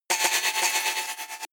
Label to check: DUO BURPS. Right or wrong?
Wrong